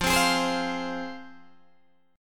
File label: F Minor, Guitar Open D